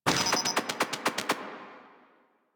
Transition Go; Warframe; Caliber Chicks minigame; transitions

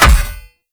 Foley Action Default Body Hit Metal Heavy; Characters; Player

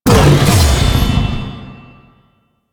Leap Cast; Warframe; Voruna

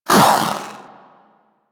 Vocal Bark; Warframe; Pets; Zanuka; Zanuka Companion A

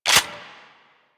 Heavy Shotgun Cock Start; Weapons; Warframe; Tenno

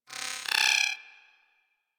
Beep Comby; Warframe; Ambience; Corpus; Beeps

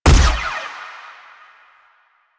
Shot Fire, Weapons; Warframe; Acceltra Prime

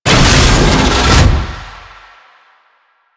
Tank Turn Half, Movement; Warframe; Lasrian Tank

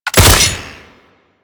Sawman Shot; Attacks; Warframe; Grineer Ghouls

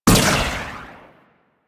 Ball Drop; Warframe; Missions; Tenno Ball

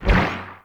Dash Bubble Start; Powers; Warframe; Focus; Operators

